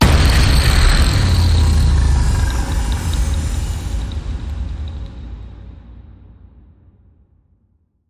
hit big; Splinterize

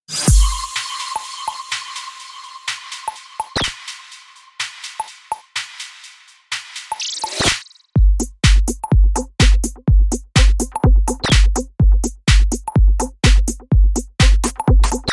I Dare You Beat

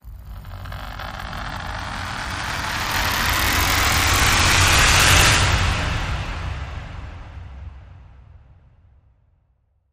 Oddball Running Rise

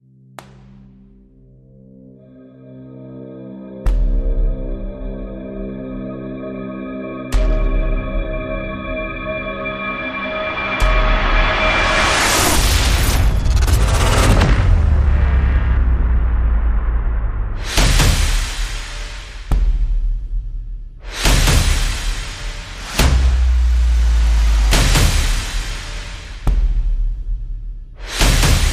Percussion and FX

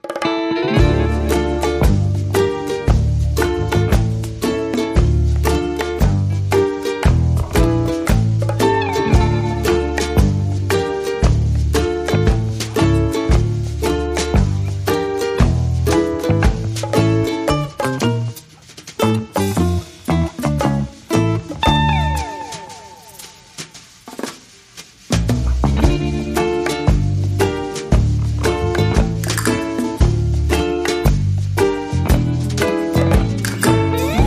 No Hammond Organ

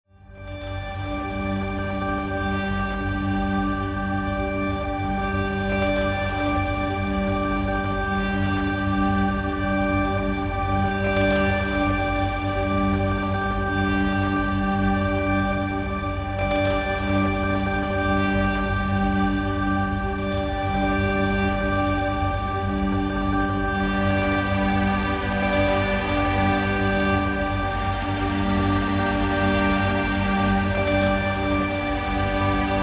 Pads

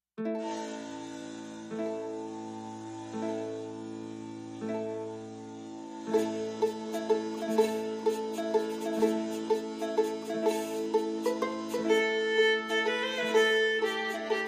No Perc

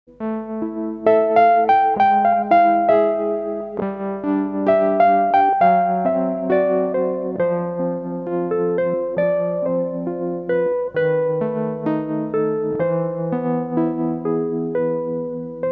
Melodica

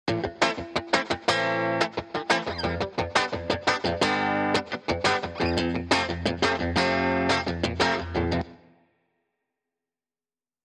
Guitars Only

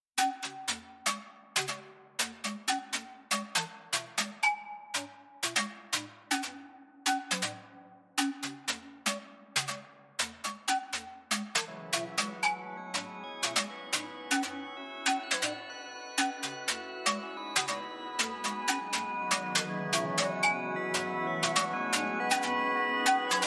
Underscore Flutes & Mallets

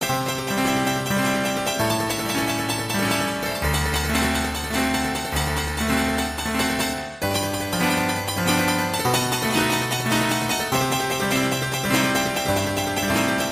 Piano Saloon Theme

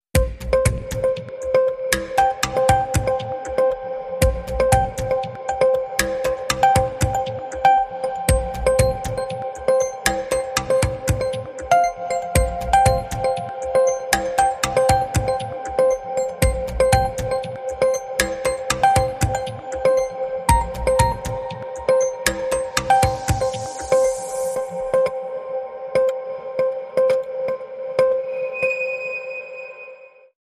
Underscore

